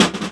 GUERRA LIK, 3-D Percussives, Ethnics; E-MU Producer Series Vol. 5 – 3-D Audio Collection

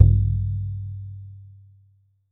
FRAME DRU, FRAME DRUMS; Voice Crystal - Steve Reid Definitive Percussion

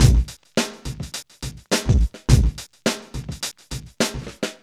LOUD LOOP, Funky Elements; Zero-G

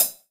HH, Drum Mashines; EdgeSounds

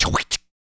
PERC, MALE PERC

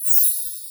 Bell Tree; Giga Samples Collection; Orchestra

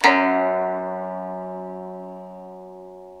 SU GZHENG, Earth Tones

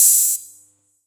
Yamaha EX-5

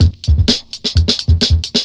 FILTER, Big Beat Drumloops

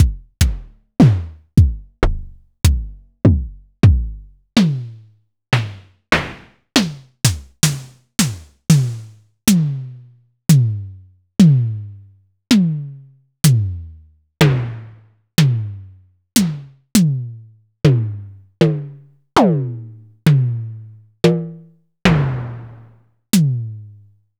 Pearl DRX-1

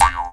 F JAW; Big Fish Audio - Didgeridoo and Other Primitive Instruments; JAW SIMPLE

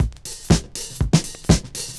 120 VINYL, DRUMS

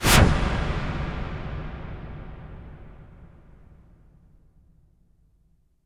IMP WIPE, Cinematic Impact

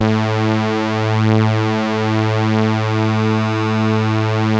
SAW, Synth lead

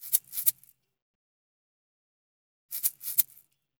SWT SHAKER; Spectrasonics; Liquid Grooves 1 & 2

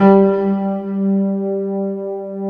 ISLAND PN

